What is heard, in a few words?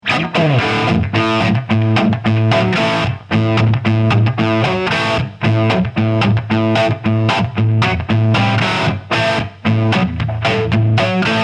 Gibson Les Paul Marshall 2204 Celestion G12H65 Shure SM57